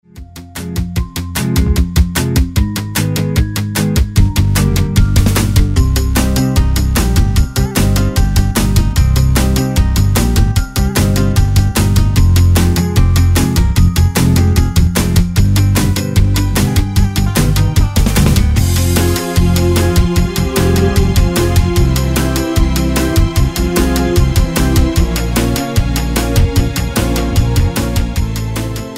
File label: instrumental